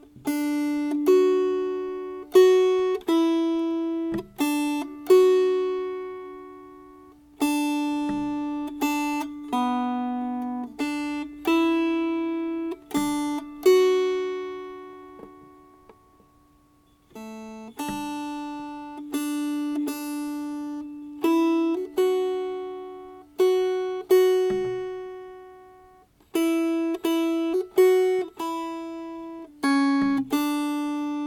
Melody; Danville Girl

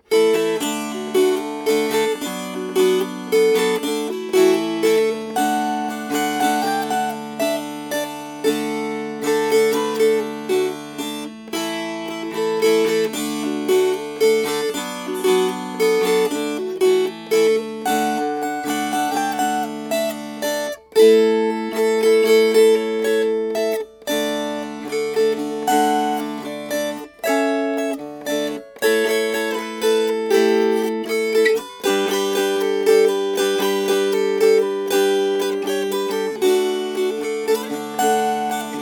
Strummed; Cumberland Waltz

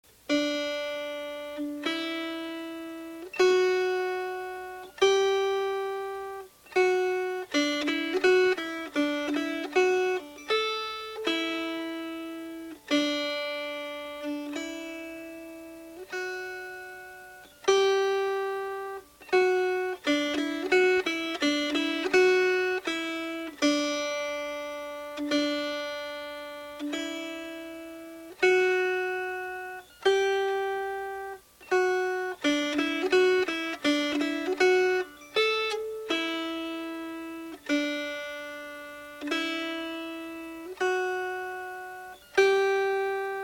Spotted Pony Melody

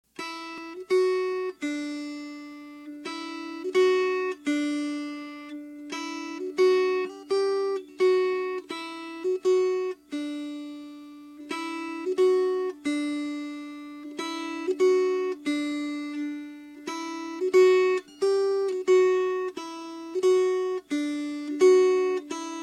Melody

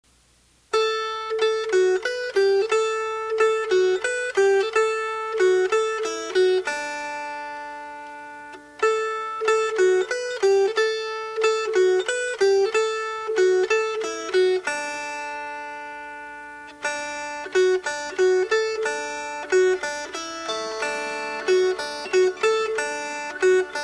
Melody

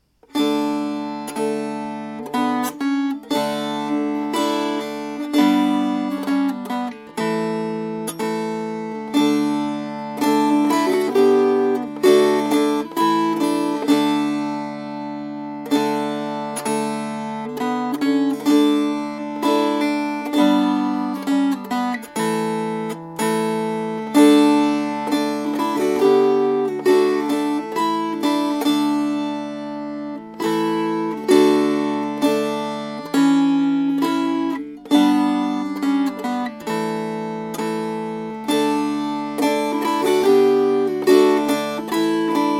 Strummed; Den Signade Dag

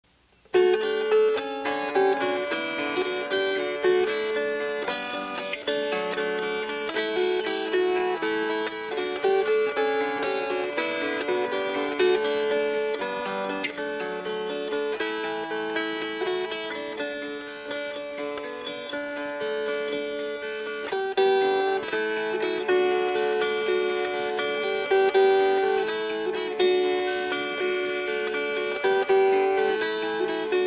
Fingerpicked

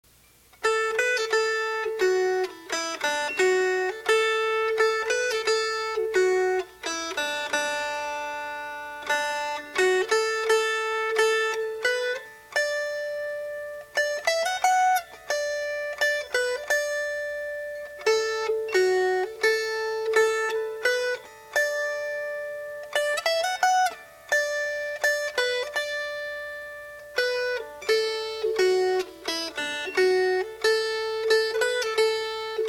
Melody

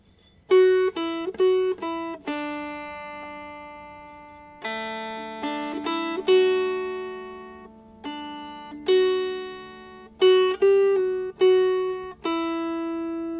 Melody

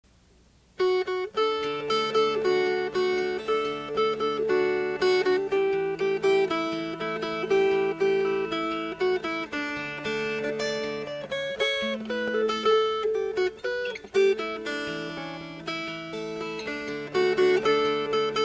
Fingerpicked; John Peel

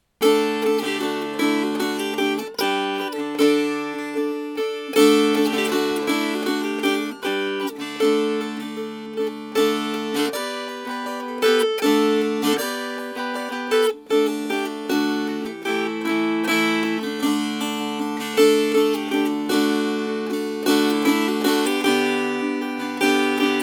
Strummed

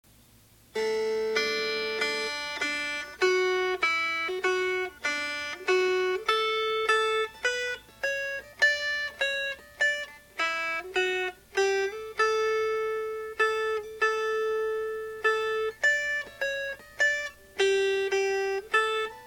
Melody

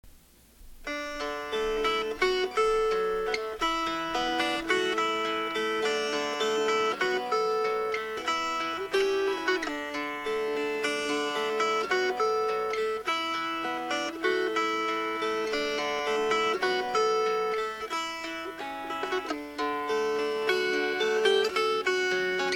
Suo Gam Fingerpicked

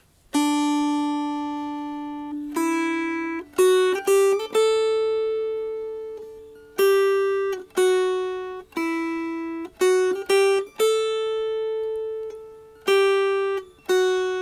Melody, Dulcimer

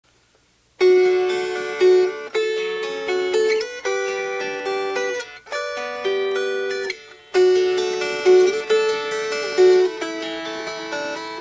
Fingerpicked

